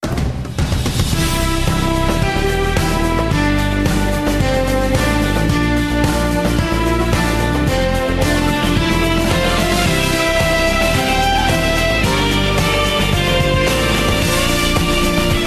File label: backing track